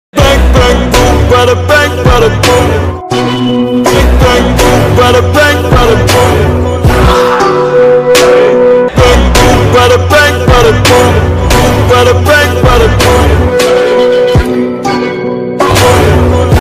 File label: villain bgm attitude ringtone; dhruva